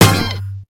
HIT, Hits & Brass

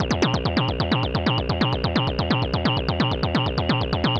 HARDCORE B 130, SYNTH SEQ, Trance; AMG; Global Trance Mission VOL-2

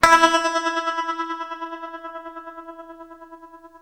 TREM E 3, Guitar, TREMELO; East West; Steve Stevens Guitar Samples Collection

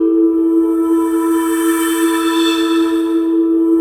PAD, Drum & Bass, 130 BPM; Ueberschall; Drum & Bass Resonance

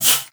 PUSTE, BASSOON; Xsample VOL-6

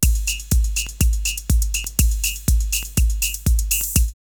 drumloops Techno House 122; Chickago; X-Static Goldmine

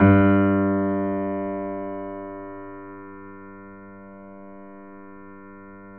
G 1, GRAND P; USB Soundscan vol.17

